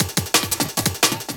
175, Breaks; Drum&Bass; EZ Rollers

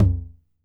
V9 Tom M; DIRTY 9

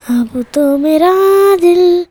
CHILD, Indian Traditions

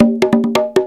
CONGA LOOP; E-MU Formula 4000 Series Vol. 2; Dance Set 2

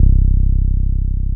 TB 303

